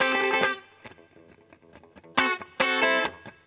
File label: GTR, Roots Funk; USB Soundscan vol.41; AKAI